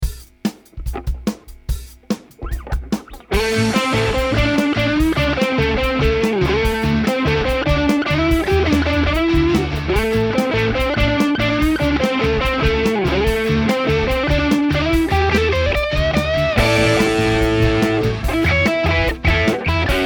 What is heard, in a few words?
guitar girls pop